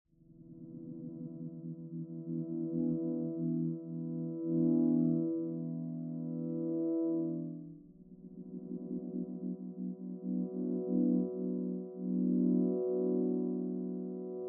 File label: XLFO